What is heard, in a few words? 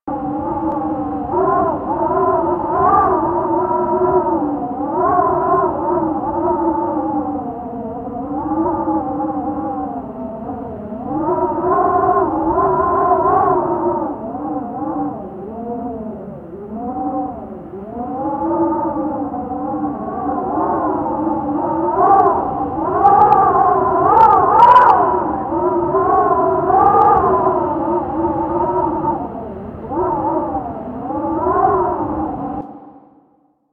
Mountain Pass Wind Through Rocks Loop; Ambience; Warframe; The War Within